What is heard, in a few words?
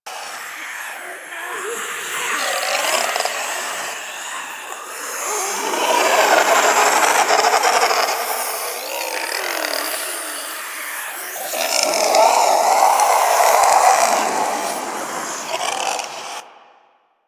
Shadow Vocal Aura Drain Loop; Warframe; Duviri